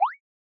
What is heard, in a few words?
Decline, retro rpg menu sounds; leohpaz; hadean